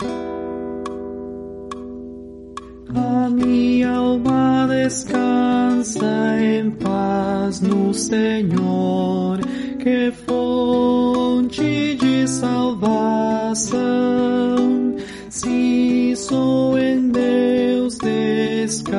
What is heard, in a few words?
tenor